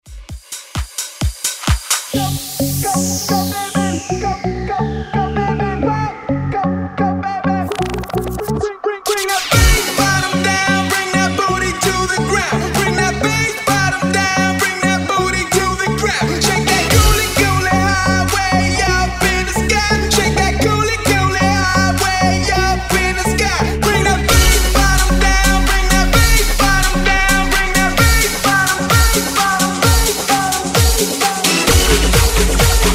Dance; Redrum

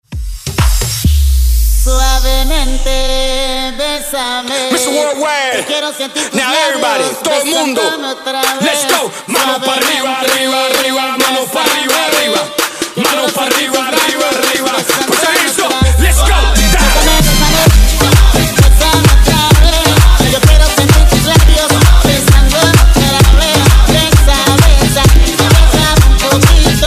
Latin Redrum

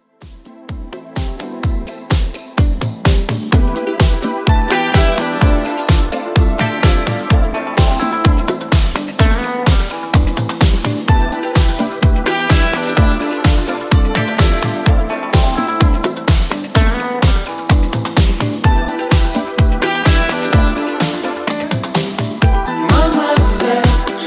Club Classic Mix 127 Bpm

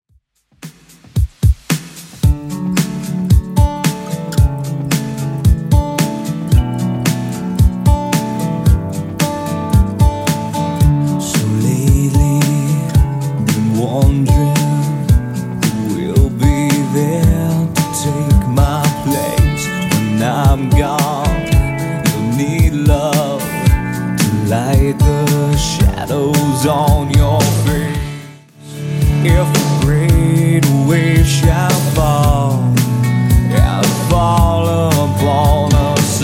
2ks Alternative Redrum 112 bpm

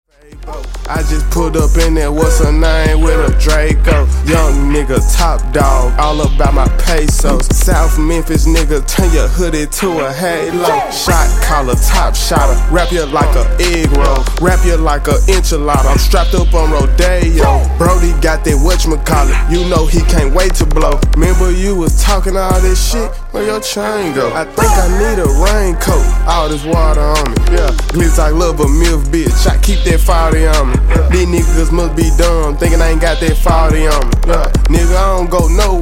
71 bpm

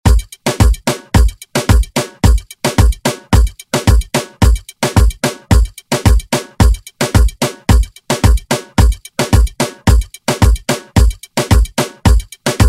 Moombahton Redrum

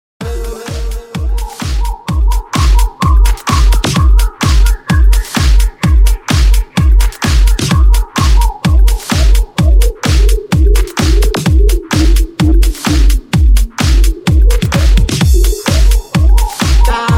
REDRUM 128 BPM

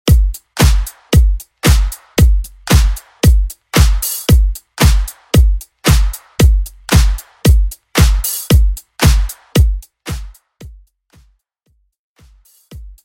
K-Pop Mainstream Hype 114 Bpm; Vegas Edit

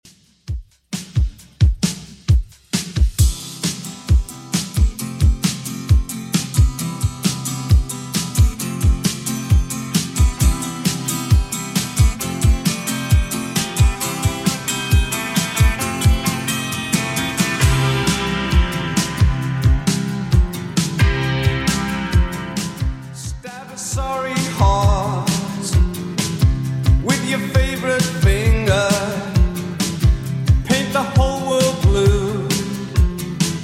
80s Redrum 133 bpm

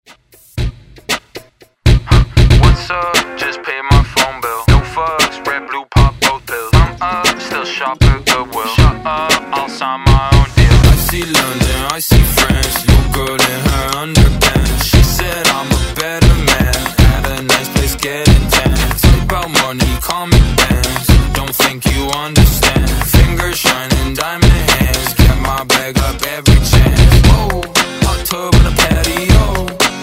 Pop Redrum

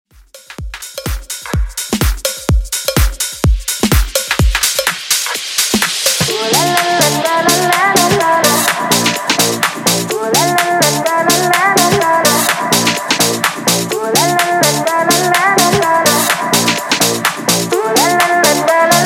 Electronic House 126 Bpm

